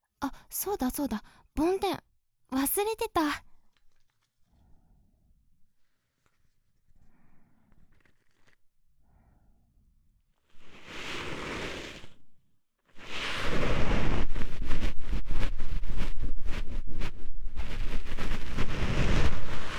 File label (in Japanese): 梵天 右